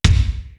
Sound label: wet kick; East West; Global Sounds